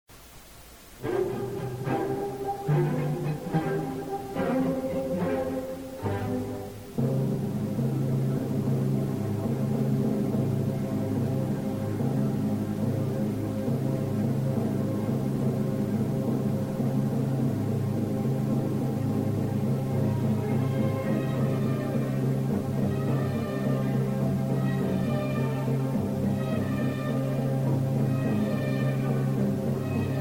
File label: Deceptive Cadence; Sym5